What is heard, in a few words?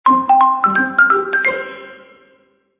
incoming call